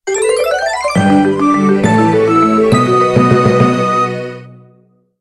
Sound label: Item Obtained!; pokemon mystery dungeon gates to infinity